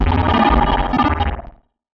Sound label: Bass Synth & Delay, contact mic, Tin Of Guitar Strings; musicradar; contact mic madness